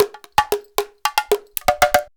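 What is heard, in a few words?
emelako grooves; Best Service; World Colours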